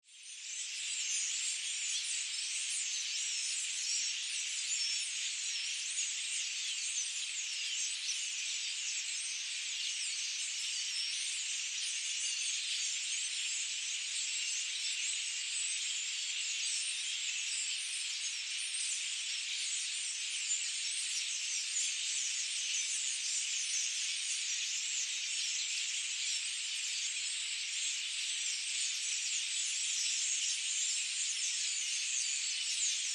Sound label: Ambience, Birds, Forest, Active; Forests And Jungle Sound Effects